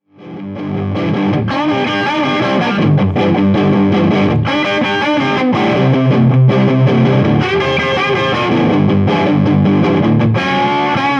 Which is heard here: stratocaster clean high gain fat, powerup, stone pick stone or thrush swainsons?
stratocaster clean high gain fat